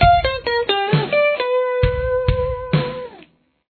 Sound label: lead, guitar